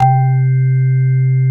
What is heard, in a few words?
Korg CX3, C 1